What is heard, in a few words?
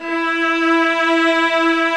ARCO VIOLAS; OMI - Universe of Sounds